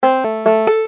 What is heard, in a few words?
breakdown, banjo